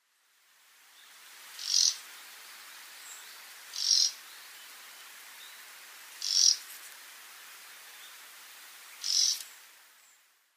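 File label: taiga flycatcher, sakata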